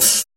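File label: Closed Hi-Hat, Dance Drums; Best Service ProSamples vol.15; AKAI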